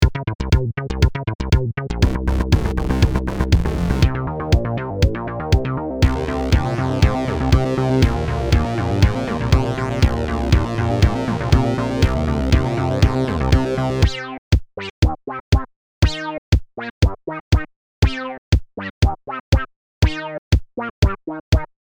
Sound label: SH101 Cloud